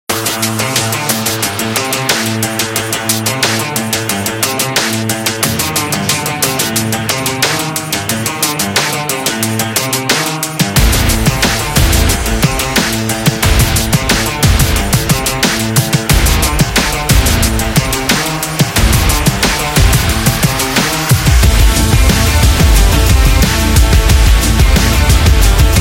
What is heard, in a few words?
Dynamic Action Rock